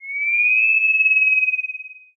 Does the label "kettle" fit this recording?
Yes